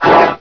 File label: race alert; svencoop